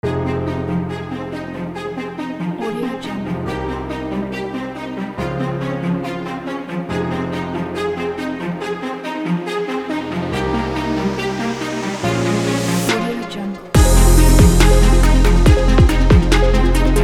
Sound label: epic technology for strings